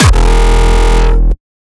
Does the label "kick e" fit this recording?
Yes